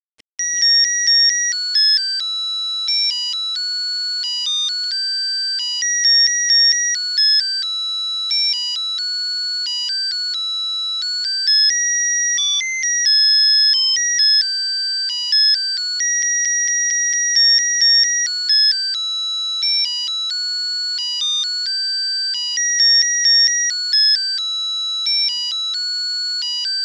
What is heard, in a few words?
For elise, Melody song